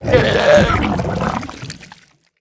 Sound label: Ooze Death